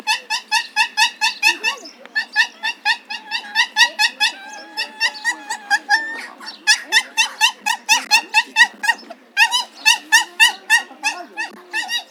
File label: Speothos venaticus bark; Barking calls of two adult males during an appeasing interaction